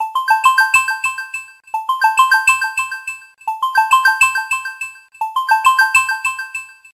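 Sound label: cheerful bells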